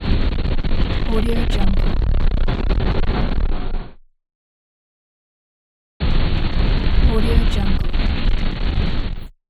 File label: transmission static breakup